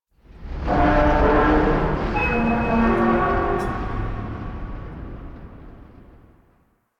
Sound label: Ambient Tank Vocal, Ambience; Warframe; Nineteen Ninety Nine